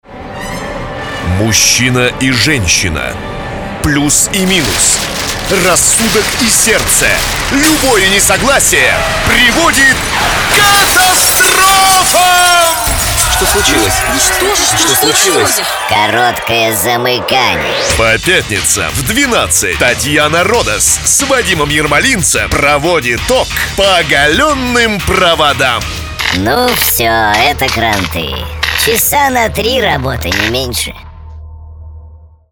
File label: elektro